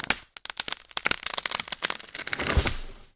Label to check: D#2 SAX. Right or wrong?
Wrong